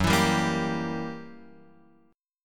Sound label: Gb 7th Flat 9th, Guitar, Open F, Gb7b9 chord; strummed; JGuitar